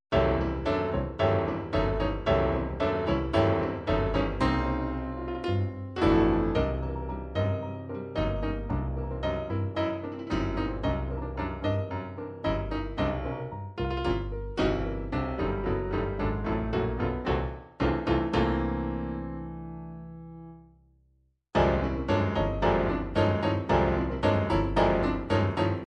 cha cha cha, midi

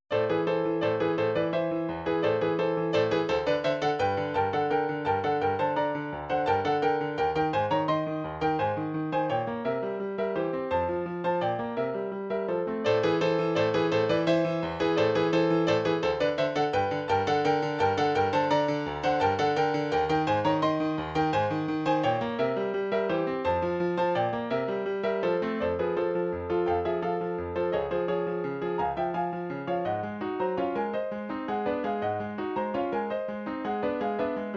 waltz, midi

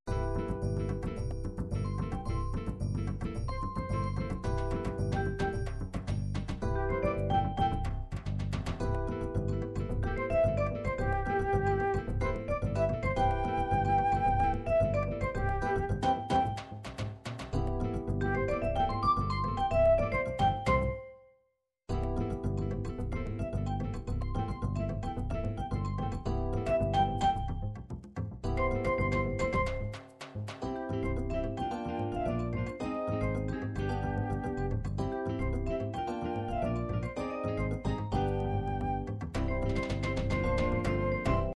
samba, midi

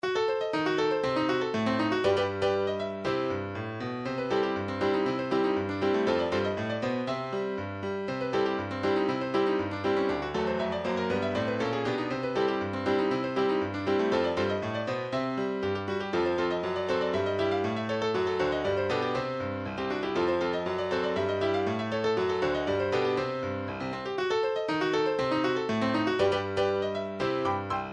piano version; super nintendo earthworm jim who turned out the lights

